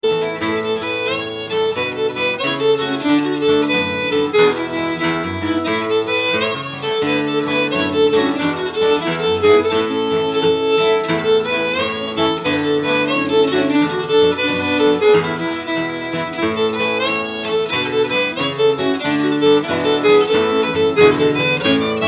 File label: A Jig 2 fiddles and guitar